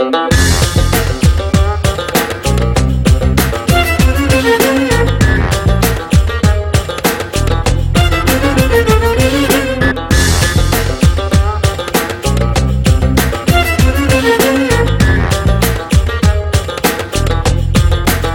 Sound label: Arabian Sound